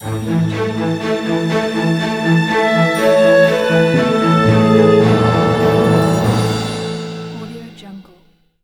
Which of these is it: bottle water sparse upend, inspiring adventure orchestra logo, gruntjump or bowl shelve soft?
inspiring adventure orchestra logo